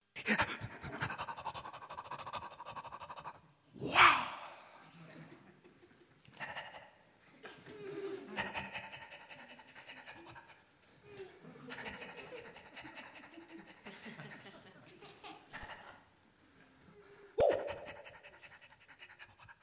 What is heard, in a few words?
wahwah